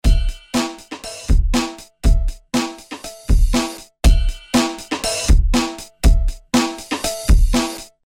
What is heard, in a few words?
loop